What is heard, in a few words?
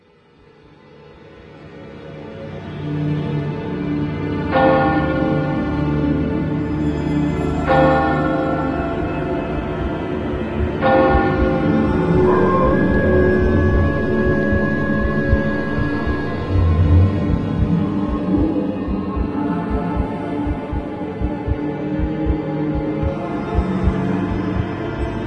Halloween Horror